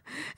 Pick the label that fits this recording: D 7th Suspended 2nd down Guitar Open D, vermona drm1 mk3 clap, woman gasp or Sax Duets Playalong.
woman gasp